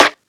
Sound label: snare; dough-samples; eddyflux